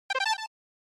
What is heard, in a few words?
SNES Baseball Select